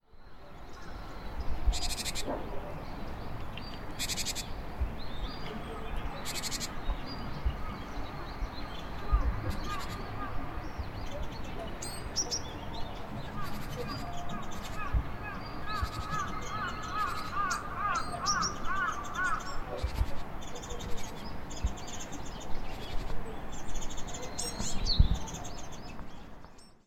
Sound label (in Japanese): shijyukara, call